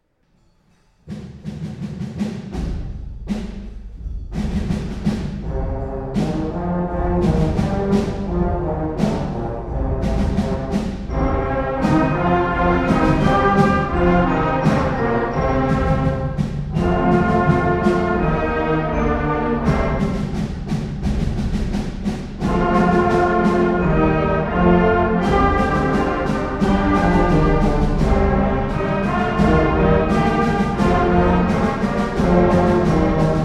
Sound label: Turbo Rock